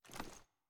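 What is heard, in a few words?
sniper ads down; viper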